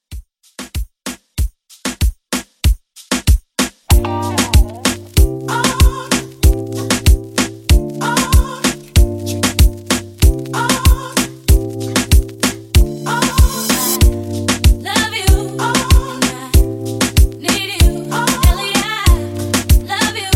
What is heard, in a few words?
Moombah Re Drum 95